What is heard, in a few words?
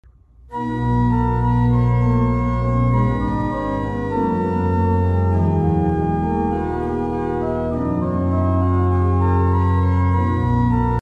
Organ